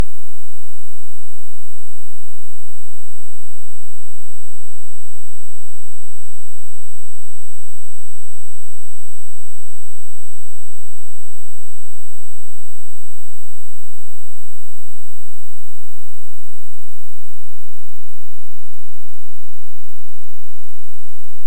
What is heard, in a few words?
Hydrophone; OneOcean